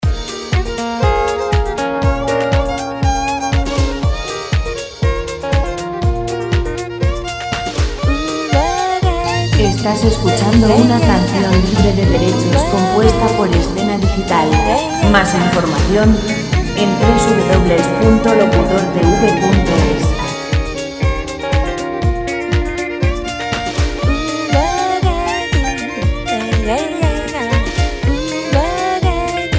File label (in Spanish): jazz vocal musica sin copyright, 120 Bb; background music